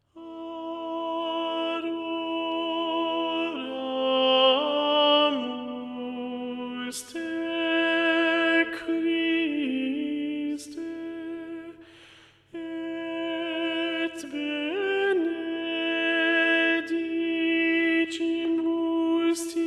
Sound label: Adoramus te A, choir tracks; Palestrina